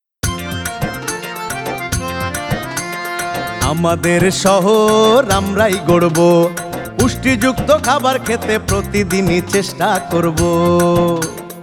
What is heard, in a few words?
jingle